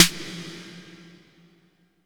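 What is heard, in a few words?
reverb snare; East West - Phat & Phunky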